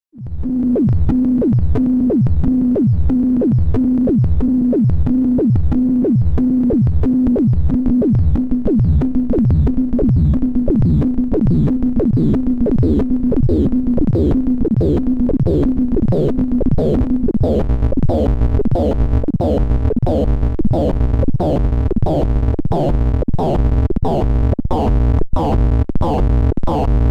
KASTLE Sci-Fi

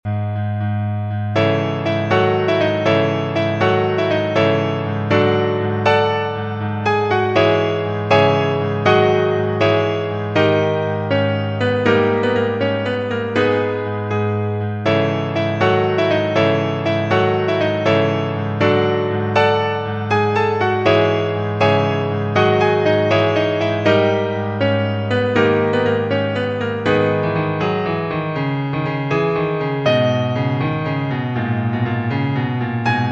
piano; mulab

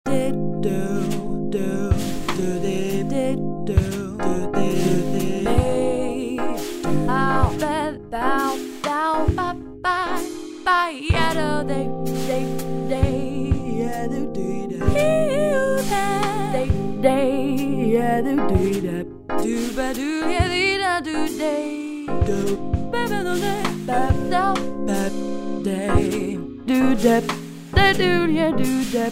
Scats; Zampler

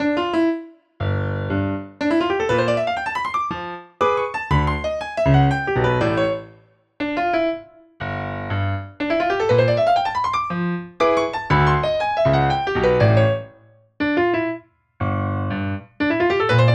Augmented Piano Vergleich

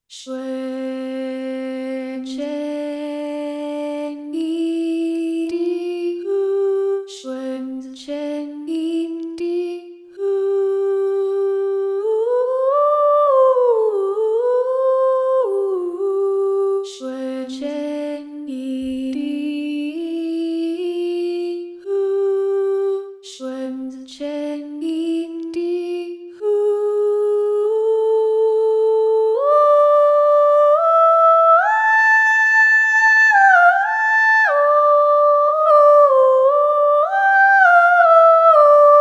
Legato; RealiVox

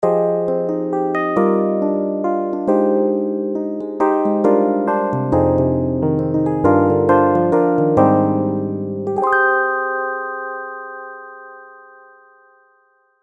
E Piano; Alchemy VA

